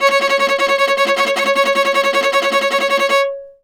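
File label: viola tremolo C#4; Orchestral; DirectWave; Varazdin Orchestral; Viola II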